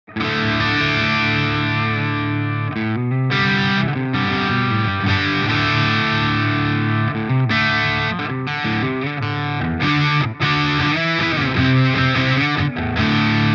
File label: VS Audio Aftermath Gain